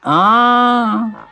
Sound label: ah nongender huh; Voice; ooh ahh